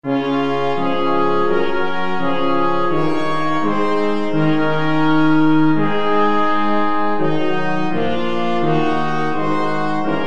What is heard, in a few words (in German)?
blechblasquintett